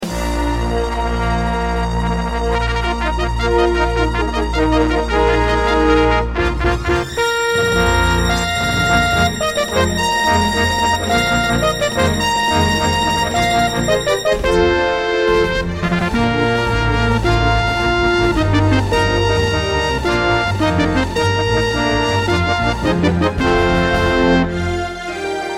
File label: Intro, Miditemp DoX-1; Dark Forces